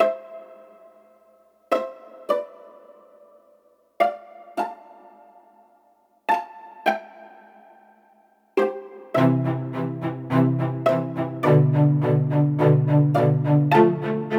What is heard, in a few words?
song, medieval violin based track; skywind